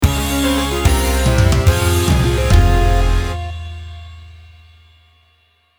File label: bgm judge fail